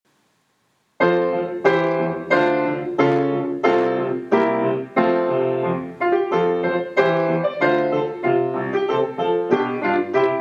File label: Accompaniment